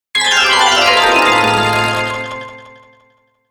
Mallet Descending Scales Delay; Blastwave

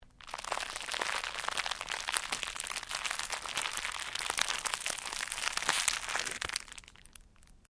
Cellophane crinkle

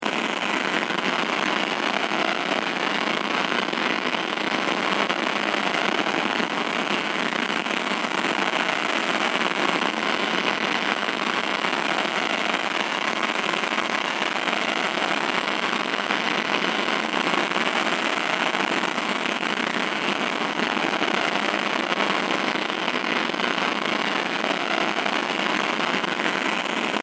Fire Flare Loop; Flames

